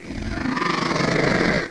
Lion Growl

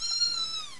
Kitten

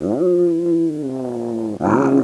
CAT GROWL

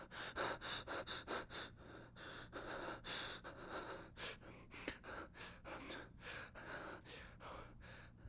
scared breathing

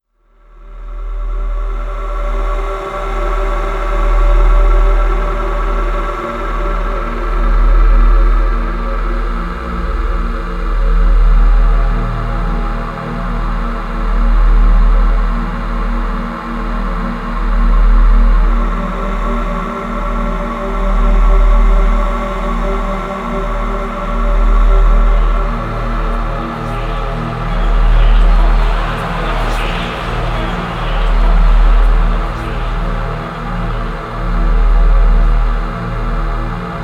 Drone, horror

